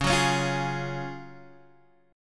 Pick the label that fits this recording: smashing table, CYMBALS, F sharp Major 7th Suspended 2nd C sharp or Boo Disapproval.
F sharp Major 7th Suspended 2nd C sharp